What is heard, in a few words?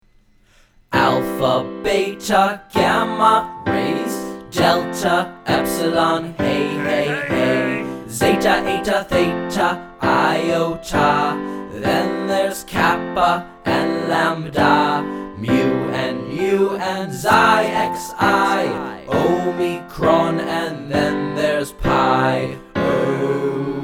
Greek Alphabet Song